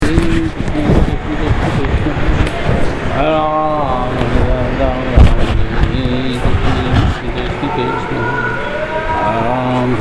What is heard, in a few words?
Chanting Japa; ISKCON Swamis; His Holiness Subhag Swami; Bhajans; in front of Prabhupada Samadhi; Vrindavan